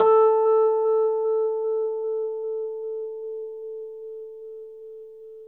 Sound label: Rhodes 73 A; E-MU Producer Series Vol. 5 – 3-D Audio Collection; 3DRhodes